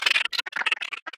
Hoarder Bug Cry

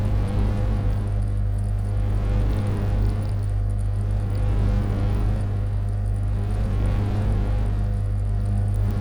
Lung Machine; Lethal Company

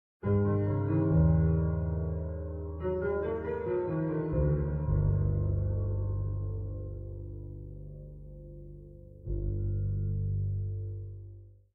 Stinger Enter Manor

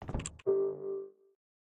Enter Terminal

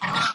caw scream

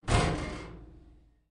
Loud Creak

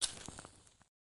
Light Candle

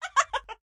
Laugh

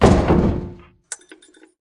Item Dropship Land

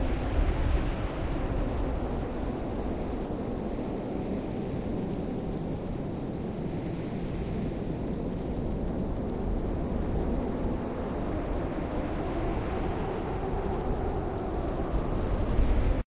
Blizzard

